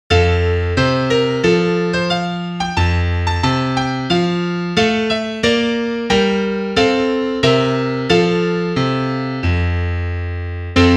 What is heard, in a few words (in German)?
Klavier; aus amerika